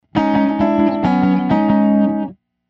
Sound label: tape slapback